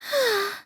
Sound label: sigh; tsumugi